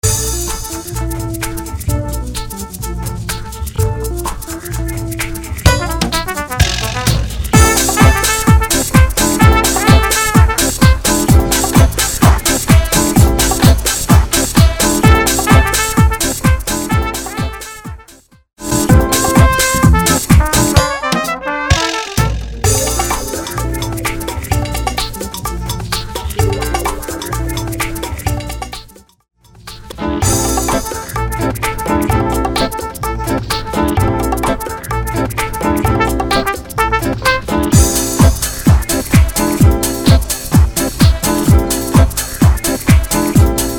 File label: Trumpets